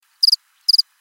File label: Cricket